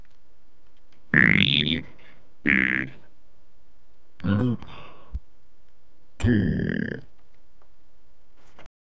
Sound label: motivational